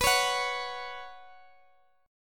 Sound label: B Suspended 2nd B Guitar Open G